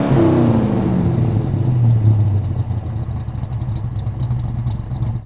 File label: 69 Mustang Rev Down; Automobiles